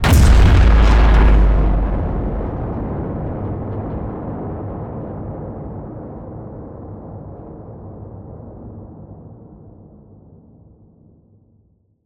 HIT Distorted, Cinematic Hits Designed

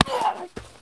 Baseball Bean Player

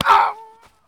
Male Hit By Pitch Baseball

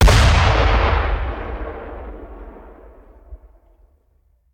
Mortar Cannon; Sound Ideas; Guns

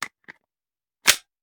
Hand Gun Foley; Sound Ideas; Guns

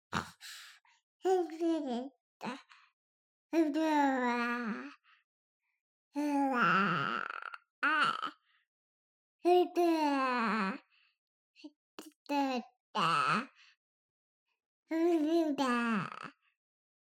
BABIES DREAM Boy 3 months Random; Baby Boom

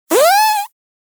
Fart Foley Strain, Thin And Windy; Hollywood Edge; sound designer's toolkit v2

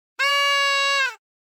noisemaker; NCH Library

